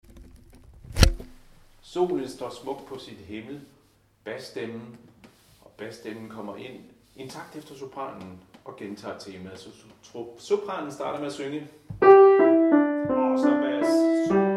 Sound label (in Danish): bas; Solen står smukt; harlev koret